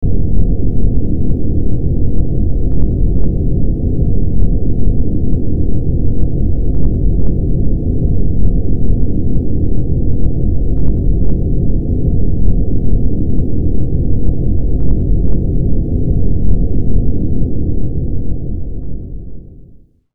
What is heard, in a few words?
Snow Ambience; A Chill Down Your Spine; The Legend of Mata Nui; Game Music; Console Games